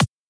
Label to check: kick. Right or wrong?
Right